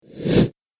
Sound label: Fastest Deep Whoosh; Whoosh Sound Effects